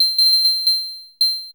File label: GUIRO; DRUMS & PERC